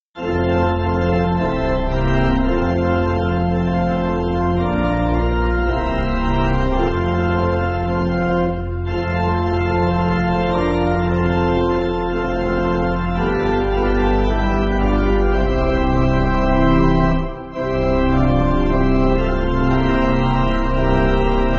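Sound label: Pipe Organ, Music Backing Files for Churches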